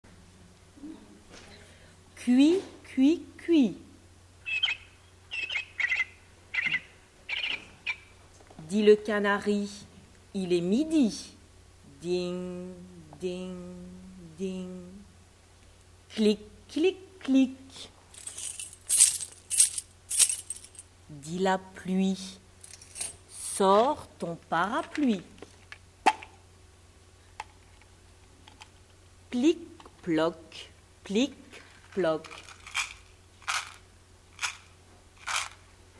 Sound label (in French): Comptine sonorisée La pluie